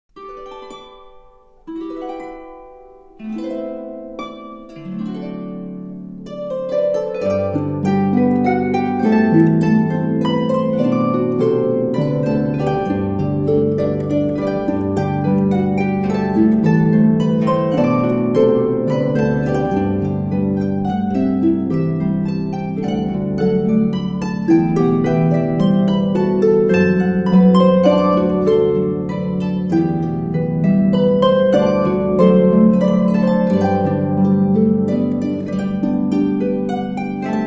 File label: infant in the manger, christmas carols on the harp